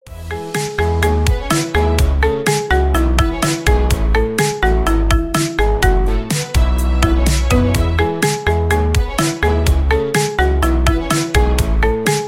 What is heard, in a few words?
marimba remix